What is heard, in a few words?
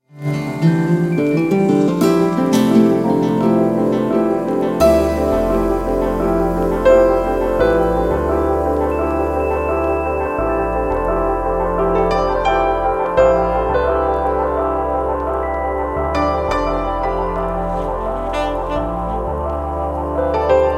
ambient jazz ensemble